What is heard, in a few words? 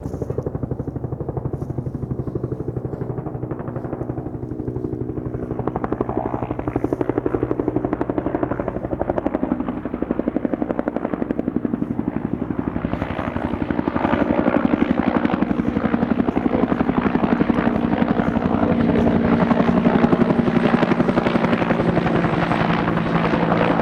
Heli Bell 212 Land